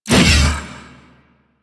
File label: Big Slash Whoosh D; Crp Big Slash